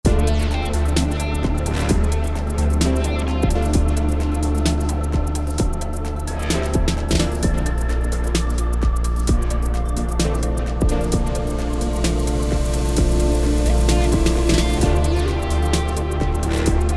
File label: trance chords